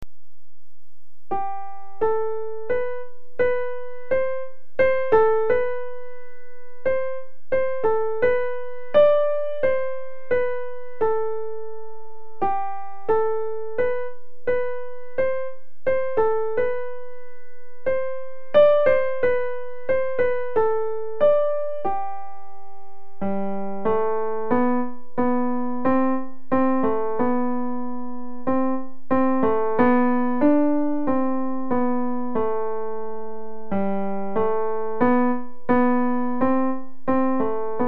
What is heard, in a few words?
Piano; Piano Tutor Book 3